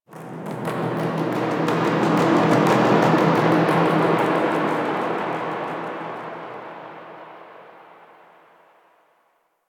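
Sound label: Amphitheatre Echoes; Ambience; Warframe; The New War; Zariman; Quests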